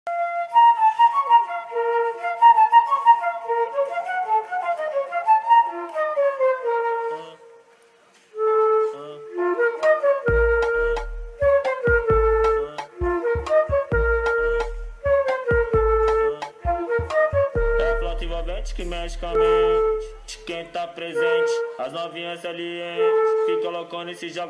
Flauta

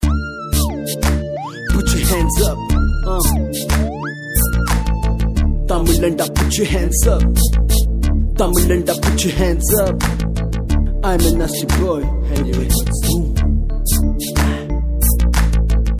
Banda

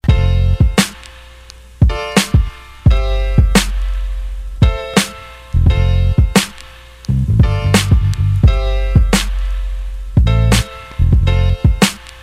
Pistas De Rap

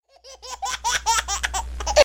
Risa De Bebe

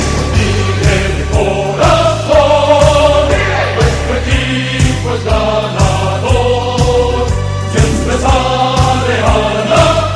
Himno

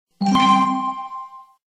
Zumbido Messenger

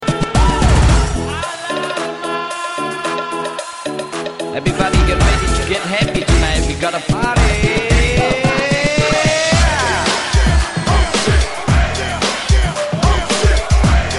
Alarma Rmx

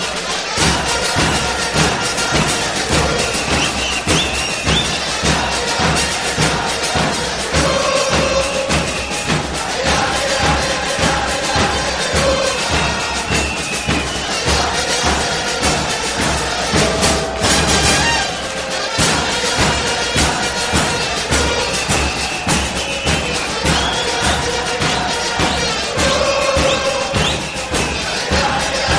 Murga; Dale Boca

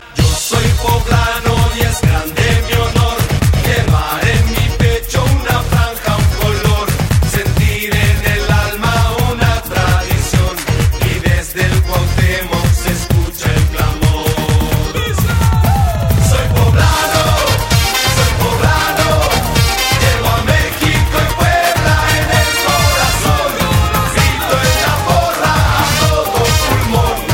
Coro

